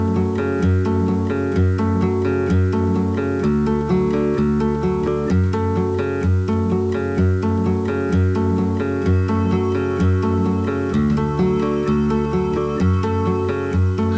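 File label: ambient music; cstrike; solid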